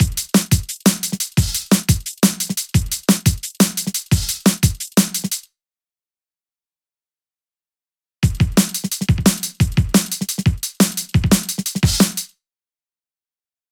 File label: drum patterns